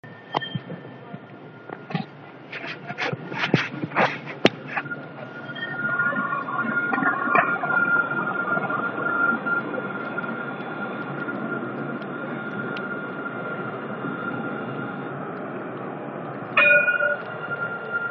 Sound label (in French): Place liberté Tramway